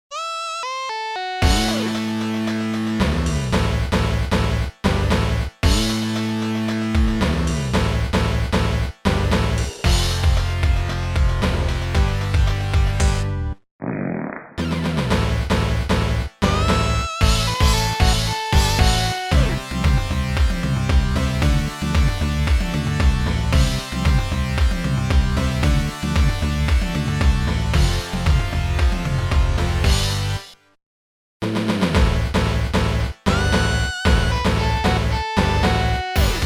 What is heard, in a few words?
Sega Genesis Instrumental Remix; Ultimate Muscle Theme; SupremeMIDI